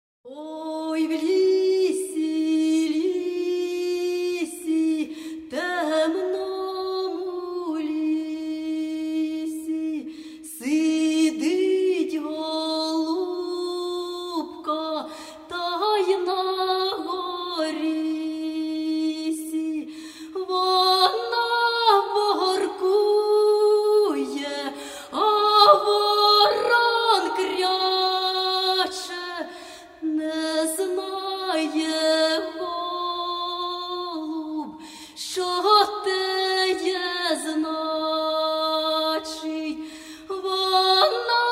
Folk Choir; Cherkasy

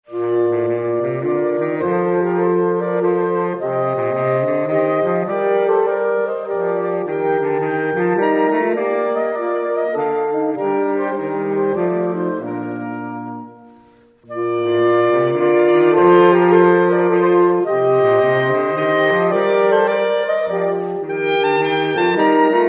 sax classics jazz

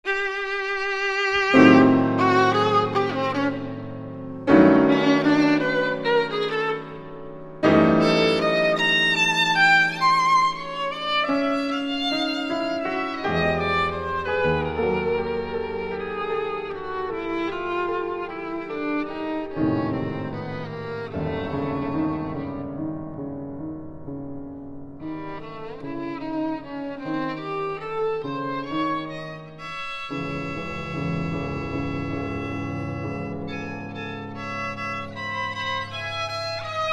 violin piano masterpieces

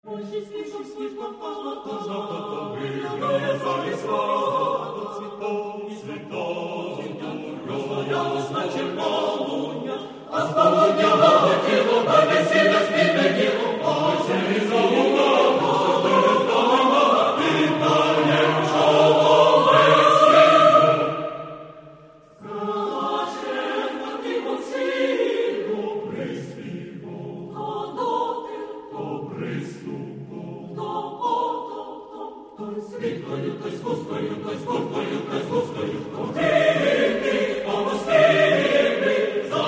choir; NRCU

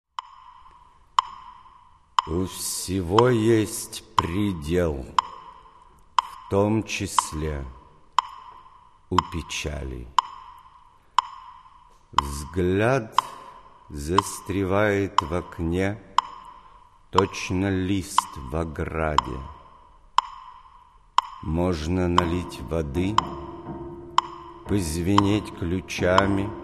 chamber

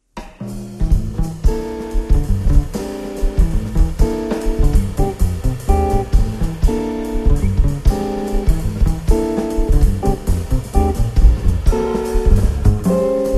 Jazz

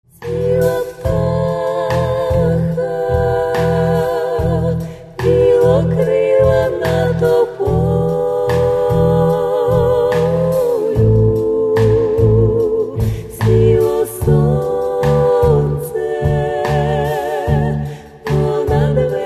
romantic songs

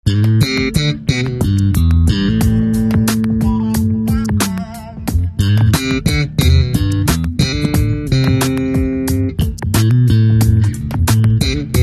bass

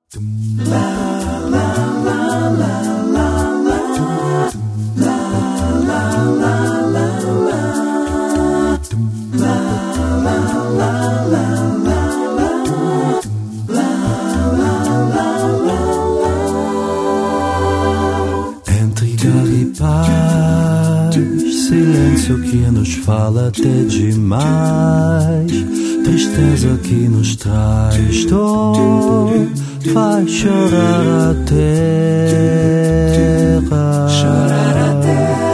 acapellissimo

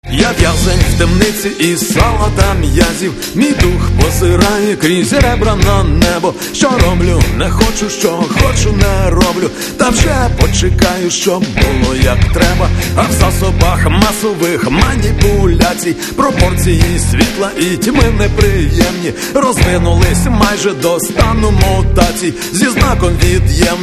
rock

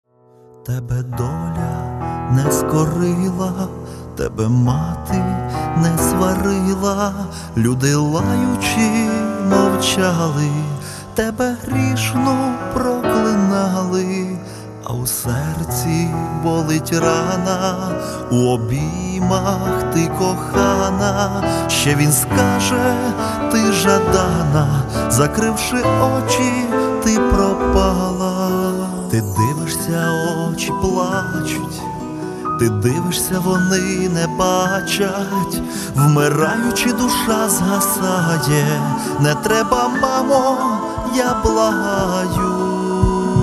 Karpatskyi Chardash

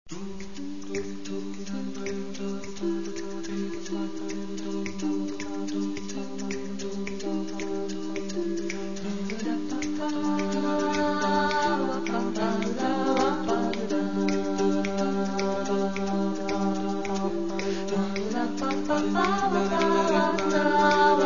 jazz choral

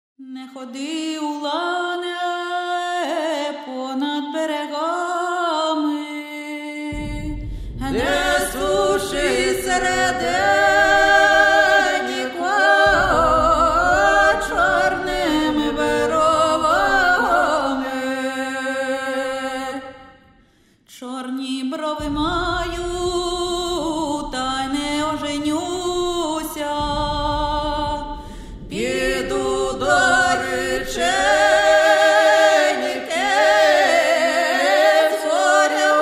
Blues

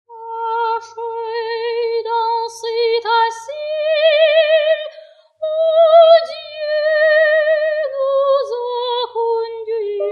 vocal miniatures

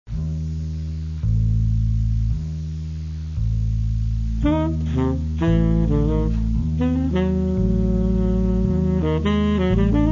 jazz; ukr jazz

